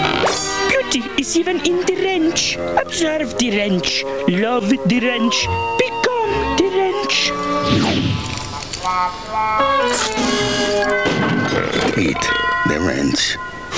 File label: wrench